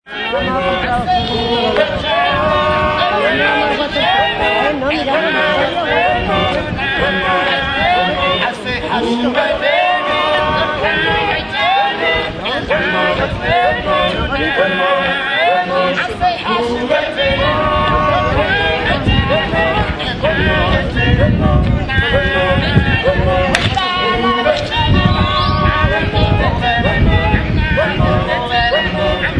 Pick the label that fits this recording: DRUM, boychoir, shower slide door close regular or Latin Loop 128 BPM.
boychoir